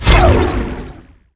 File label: Deviator Attack; Emperor - Battle for Dune